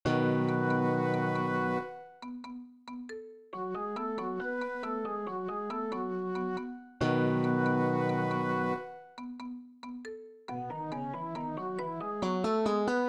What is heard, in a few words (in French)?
melodie